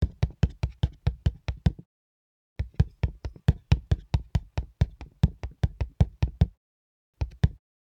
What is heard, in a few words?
Cartoon Character Footsteps